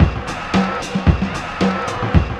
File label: LOOP, DRUM; E-Lab; X Static Goldmine 2